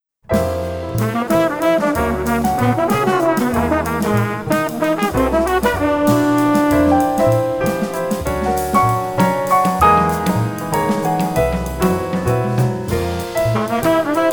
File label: wibble wobble